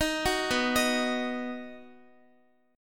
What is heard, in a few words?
B Major B down Guitar 4E 4A 5D 5G 5C 6F; arpeggiated